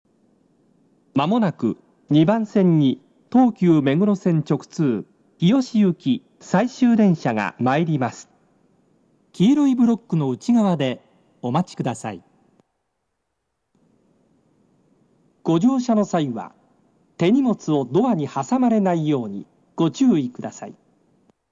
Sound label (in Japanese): N honkomagome 2bansen sekkin